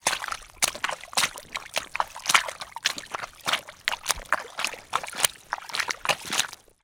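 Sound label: foley footsteps in muddy squelchy puddle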